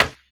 wood; casualtiesunknown

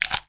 spider bite; casualtiesunknown

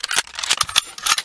reload; casualtiesunknown

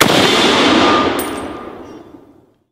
rifle shot; casualtiesunknown

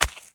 Footstep; casualtiesunknown

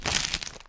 eat Flesh; casualtiesunknown

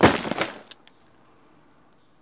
container Break; casualtiesunknown

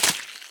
gore; casualtiesunknown

